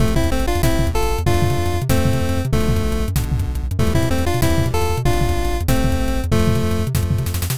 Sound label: bgm